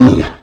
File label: golem hurt